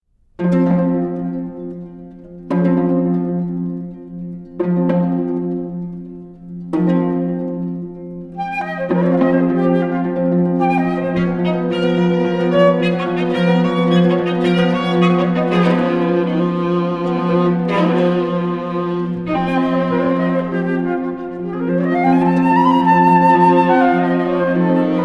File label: Sonata for Flute Viola and Harp Finale